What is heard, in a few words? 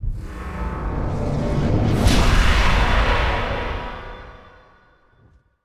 Illusion; starbites